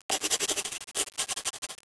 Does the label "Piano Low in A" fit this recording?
No